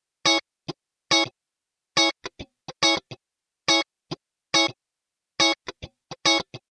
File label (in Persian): ریتم گیتار آکوستیک